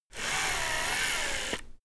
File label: servo move powerful